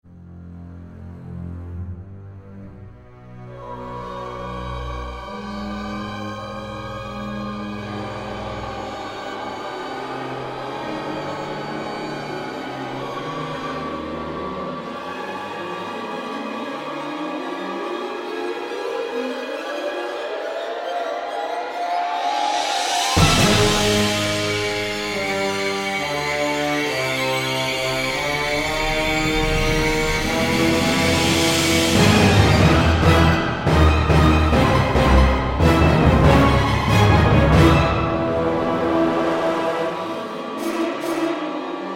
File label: Turret Ride